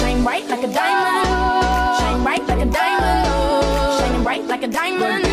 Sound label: remix shuffle dance